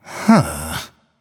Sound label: nv sigh; cupid